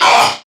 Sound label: Pain Crewmen; Warframe; CorpusCrewmen; CrewmanA